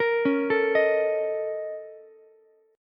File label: Bb Minor Major 11th Bb, Ukulele; BbmM11; arpeggiated; JGuitar